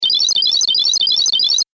Game App Recharge; video game; Game App - Recharge 1; Video Game Sound Effects

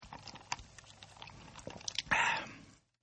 Drinking; Human; Partners In Rhyme

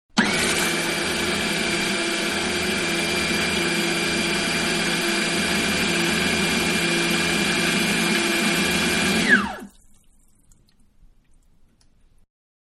Food Processor Wet; Food Processor 1-Wet Ingredients; Food Prep; Restaurants; Manhattan Production Music

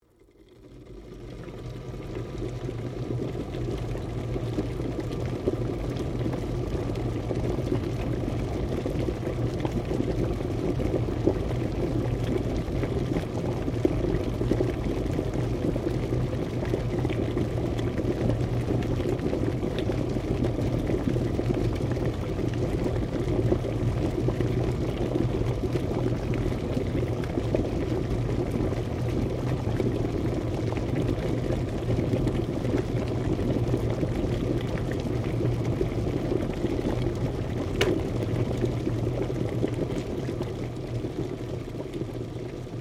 KETTLE ELECTRIC BOIL QUICK, Domestic Kettle; Kitchen; Household

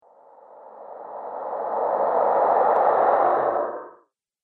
Gruesome Ghost Monster Discharge; Sinister, Scary, Spooky Or Just Silly; Haunted House; Andrew Fleming

